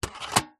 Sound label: toy stereoscope plastic disc load